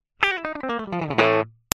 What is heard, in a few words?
brit lead